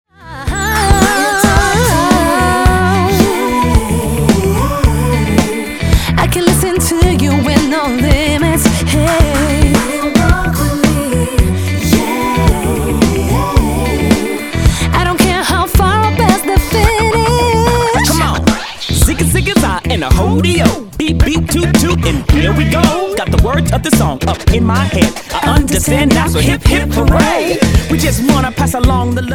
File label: funk